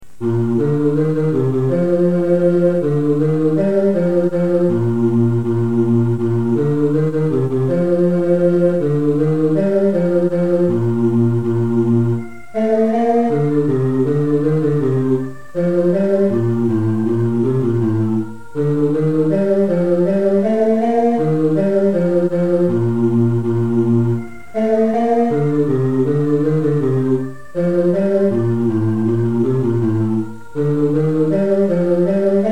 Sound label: ding dong merrily bass; Christmas; carol